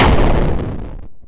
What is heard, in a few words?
cannon, weapons